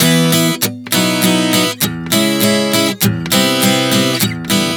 E-B-C#m-C 100 bpm, acoustic guitar chord progressions